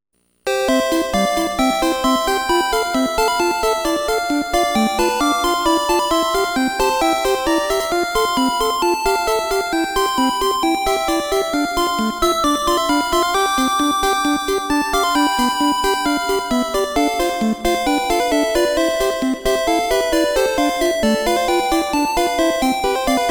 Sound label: Pokemon Gameboy Sound; cycling; Pokemon Gameboy Sound Collection